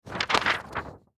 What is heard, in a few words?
Paper Laminate Sheet Handle Large Shake; Office; Blastwave